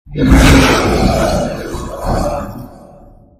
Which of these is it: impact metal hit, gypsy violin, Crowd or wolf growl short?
wolf growl short